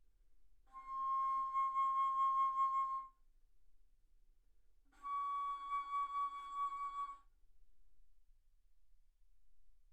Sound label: Bass Flute ff C6 Db6